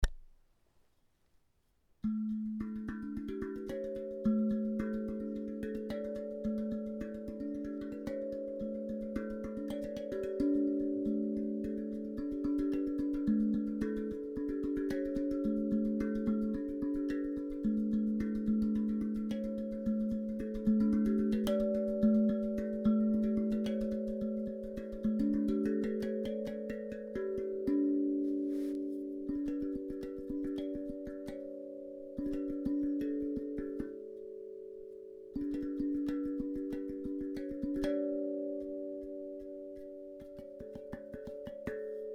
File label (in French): mandala perso mains